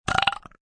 Burp Open Wet, Burp, open mouth burp short, wet (human voice); Burping Sound Effects